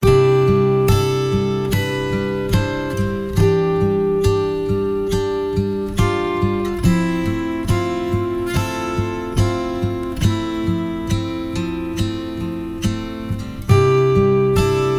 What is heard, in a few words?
Acoust Reflective III; MIUI14; HyperOS